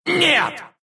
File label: death ru